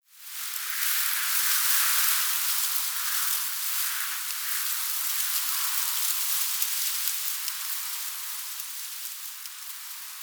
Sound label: Tree Pine Wind Leaves Relaxed Gust; Ambience; Trees